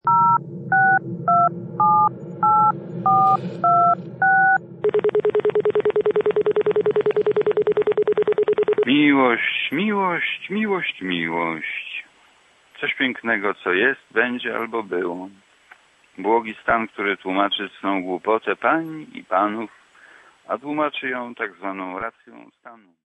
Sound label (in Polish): Glos dzwoniacego